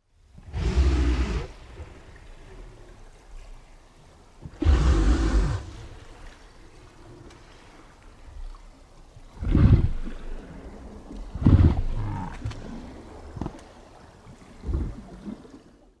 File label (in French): Baleine franche; baleines et dauphins